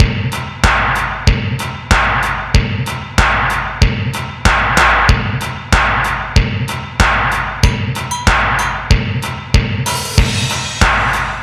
Drum Break; Rondo Brothers; Sing Into The Machine; Sonic Foundry (Sony Creative Software)

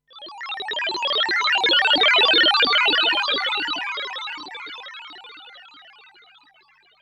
Classic S H, sci-fi

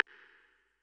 snap, Yamaha Tenori-On Snapkit

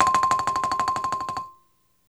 BALAFON

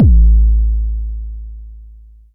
909 BD Long Tape; Goldbaby Tape808 & Tape909; The Tape 909

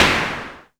Perc Noise Long, Tama TS-206

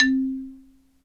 XYLO, Afro Xylophn

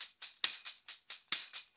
Brass Tamb, Perc Loops; Drum Loops; 4-4; Sonic Foundry; Drum Tools

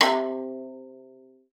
C3, VIOLA FINGER; Xsample VOL-5

